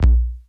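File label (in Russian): кнопка сухая одиночный резкий близкий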